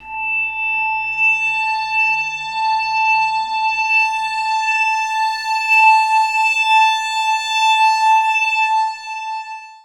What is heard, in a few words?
Guitar Textures